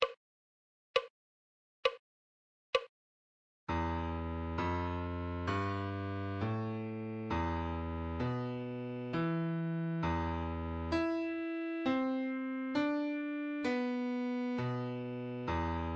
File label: musical reading in bass clef Adagio